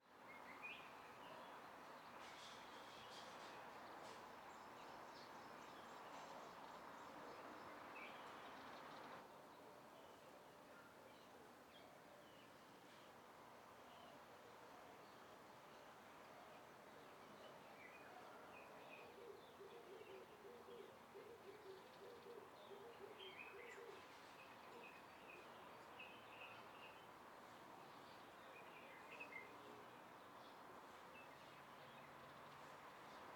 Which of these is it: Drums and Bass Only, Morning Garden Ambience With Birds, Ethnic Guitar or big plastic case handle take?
Morning Garden Ambience With Birds